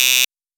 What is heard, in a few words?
wrong